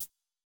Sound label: hihat